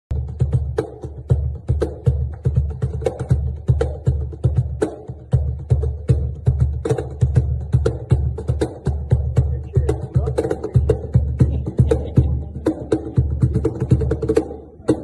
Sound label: Cajon